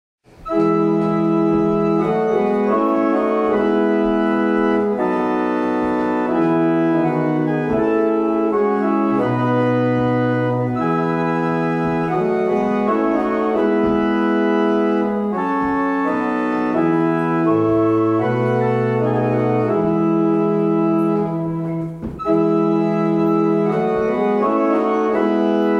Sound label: Double chant, barrel organs; Muchelney